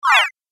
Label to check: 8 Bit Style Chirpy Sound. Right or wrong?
Right